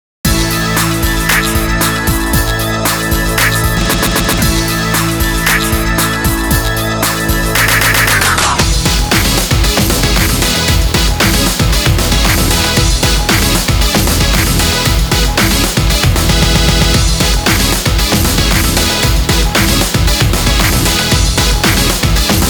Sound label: HEART BEAT FORMULA Vinyl Mix; Dance Dance Revolution